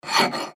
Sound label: filing